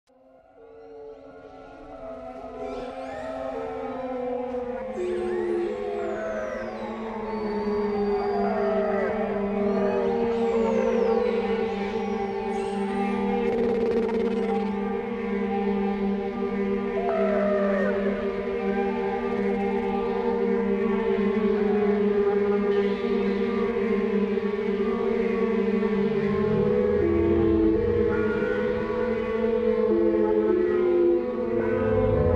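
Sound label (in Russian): zvuki kitov, whale sounds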